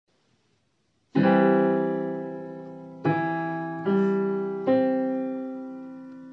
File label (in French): do maj6